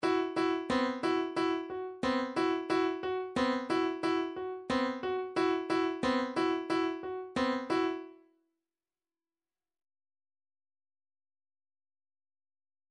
midifiles middle of the road 90 bpm; upper lip

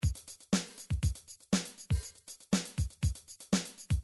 midi drums drums

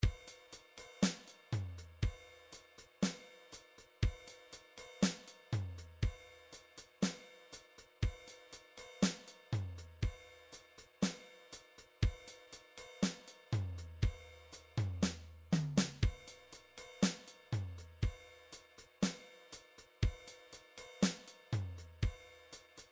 midi drums ballad

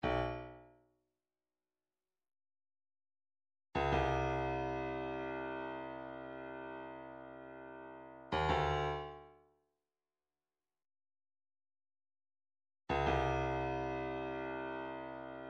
105 caxixi, midi

